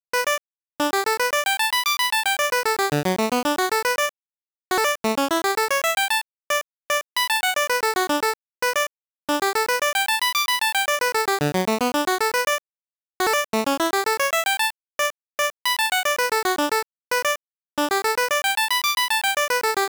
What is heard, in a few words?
arps DRY, 113BPM